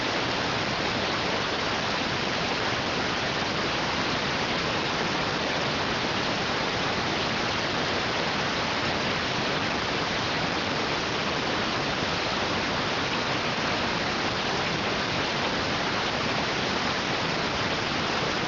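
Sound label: radioteletype receive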